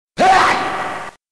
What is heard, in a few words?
Grunt; Ultraman